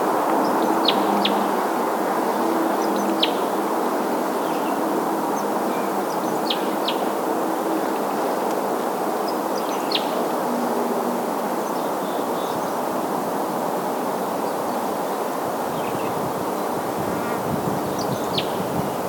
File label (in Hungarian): keresztcsőrű hangja